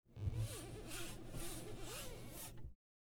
frosted glass wipe; Jerry Apps

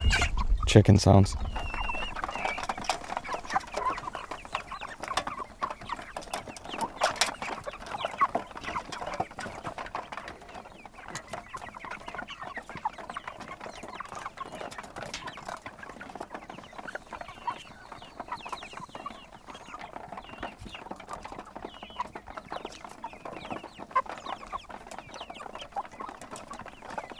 chickens, GOAT FARM SOUNDS

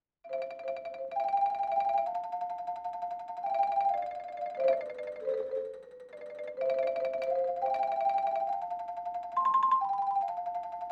Vibraphone Accent; Electa Quinney

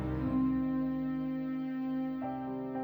Music Only; Electa Quinney

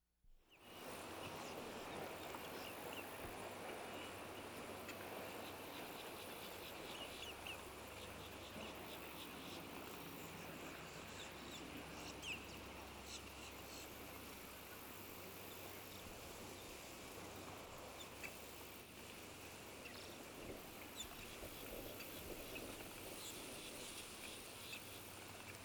Countryside Atmosphere Summertime; Electa Quinney

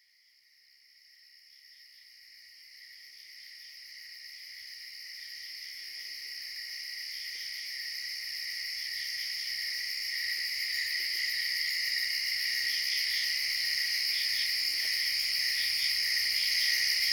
cicadas; JERRY APPS WINTER

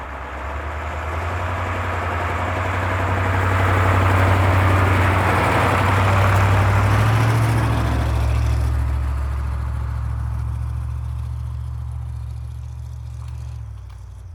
SNOWPLOW; winter; Jerry Apps Winter

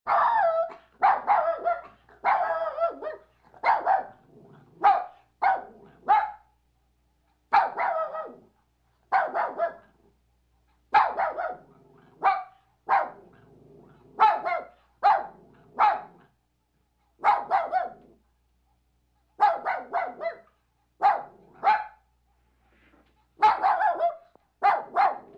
Dog, Puppy Barking, Animal

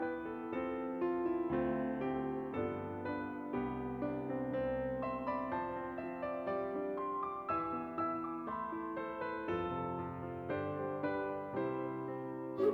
Music Only; Electa Quinney

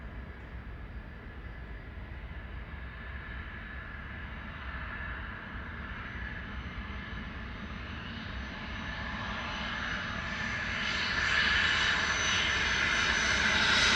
BG Airport; Poetry Project

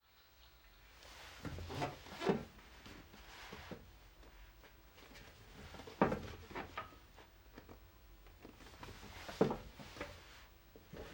Classroom, Presence, Moves, Chair Creaks; Electa Quinney

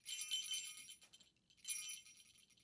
Bells, Small, Jingle, Quiet, High; Electa Quinney

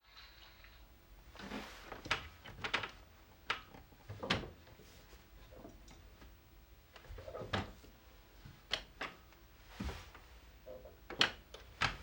Classroom, Presence, Moves, Chair Creaks; Electa Quinney

